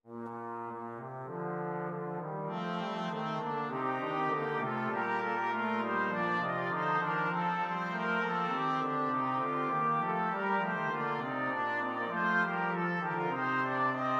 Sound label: canon in a minor, flexible mixed ensemble 4 players